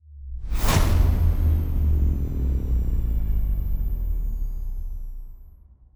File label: breach start; garrysmod